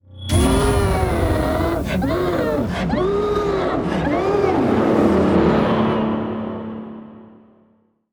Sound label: Scream; Last Rat Burned; Devour; PC, macOS